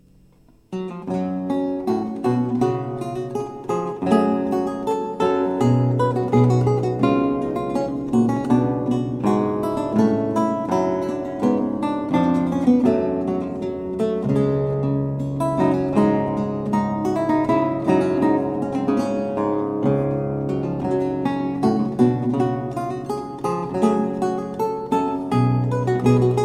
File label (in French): Suite en Sol Mineur Bourree, Lute Baroque; EG Baron